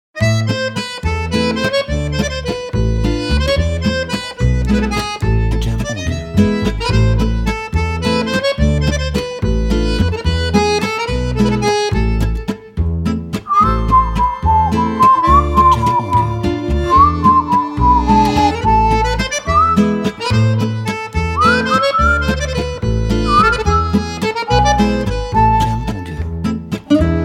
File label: French